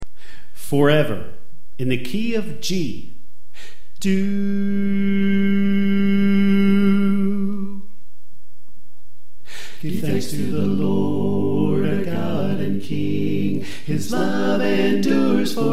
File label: Soprano Left